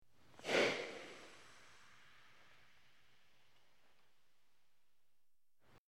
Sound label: Air Release Heavy